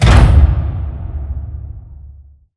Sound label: pod door close stop; Titanfall 2